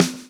lash, backbeat

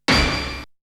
Hit; ZERO G - Datafile DISC 1

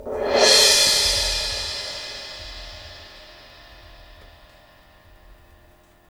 CYM MLT

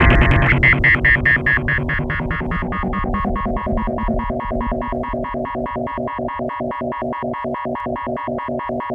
mad radio, special FX twisted; USB Soundscan; AKAI

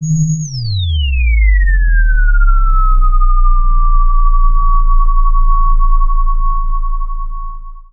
OVNI, Analog Fantasies FX; USB Soundscan vol.49; AKAI

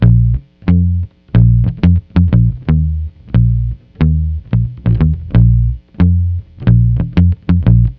Lounge Bass-A#; Zero-G Kocktail Kollection; Construction Kitz (Lounge); Welk Lounge 090

